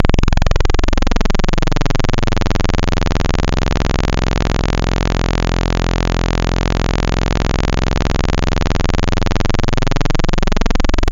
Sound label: Saw Dual; Synthetic's Serum Collection; ESW Core Wavetables; Echo Sound Works Core Tables